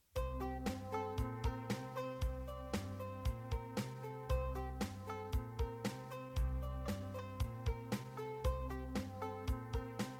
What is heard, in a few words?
Melodic Banjos